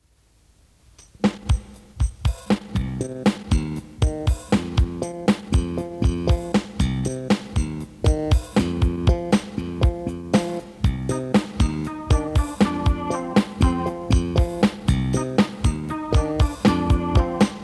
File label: Slap Bass Keyboard Funky; tape